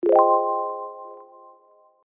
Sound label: Clavichords; Piano & Keys; Crowdsourced Sample Pack